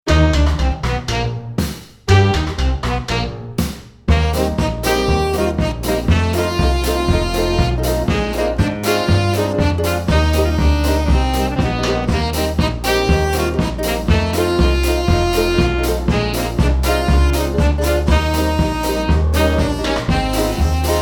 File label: Kazoo zany; Land of Kazoo